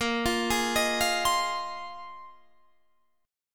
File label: A sharp 7th Suspended 2nd Suspended 4th, Guitar Half Step Down chord